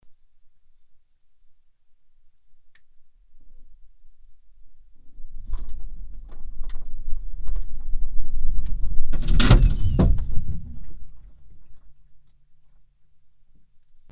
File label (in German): Streifkollision; Experimentelle Untersuchungen zur akustischen Wahrnehmung von Kleinkollisionen